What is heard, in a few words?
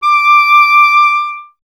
clarinet D, Solo Instruments; Miroslav Vitous - Solo Instruments VOL-1; 90 s Sample CDs